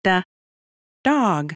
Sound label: DOG